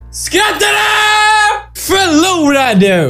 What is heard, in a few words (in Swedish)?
Skratta du